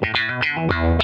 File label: Funk Guitar; 90 s Sample CDs; Zero G